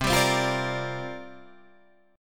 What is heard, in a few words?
C 7th Suspended 2nd Suspended 4th C